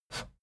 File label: fader plastic scrape single up halfway fast